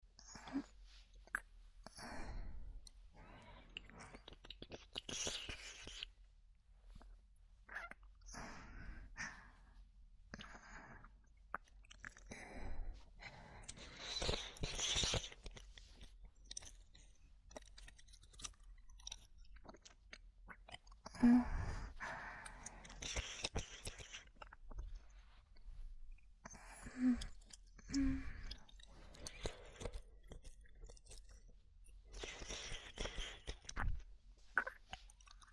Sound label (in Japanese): 添い寝耳舐め右耳, ASMR